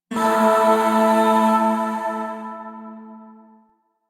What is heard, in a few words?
Shawzin Chromatic Chord J; Chords; Warframe; Zariman; Void